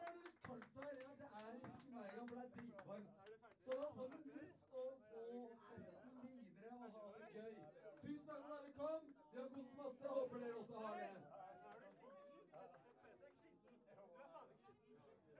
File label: floor tom